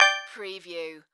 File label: Multimedia Alert